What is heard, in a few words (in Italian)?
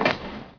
rete; freetennis